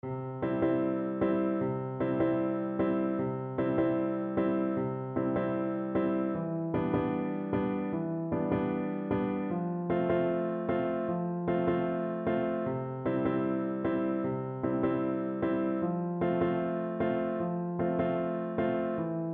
Accompagnement Piano

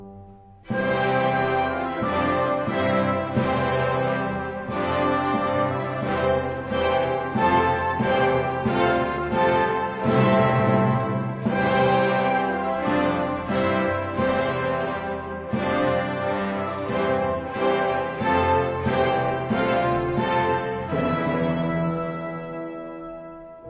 melodie russe; Cours education musicale; Voyage en Russie

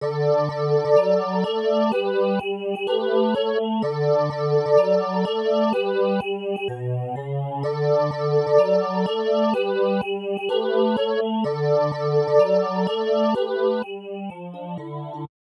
Le chat Intro fichier Midi; lesvoixdanslejazz

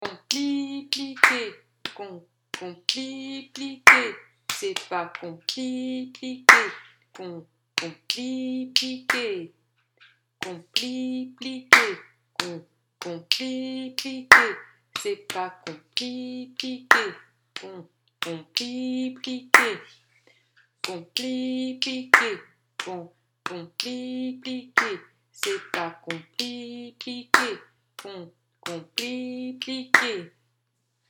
percus corpo

